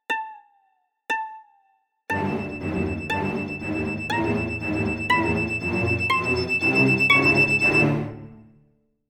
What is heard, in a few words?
Drama string suspense symphonic build up